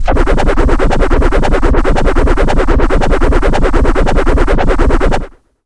Scratch, Roland JX-305

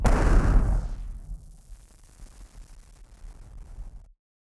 Party Popper FX

